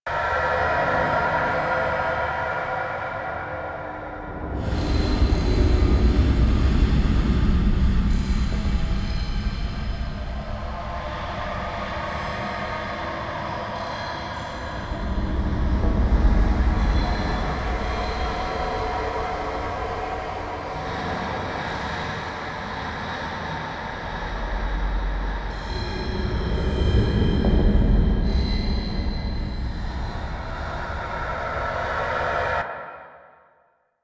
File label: Interior Bed Loop Large